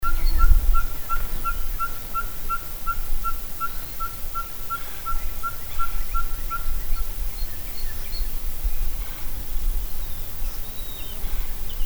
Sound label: Ferruginous Pygmy-Owl, Texas